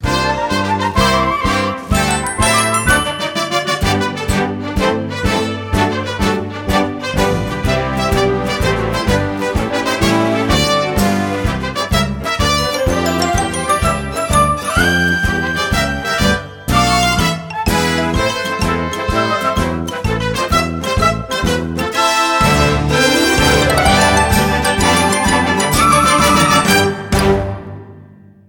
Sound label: Inst; willy wonka kids